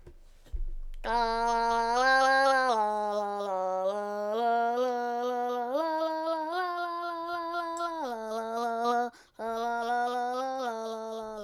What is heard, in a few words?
popper; obduction